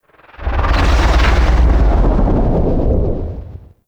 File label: Distant Mechanics; Ambience; Warframe; Corpus Railjack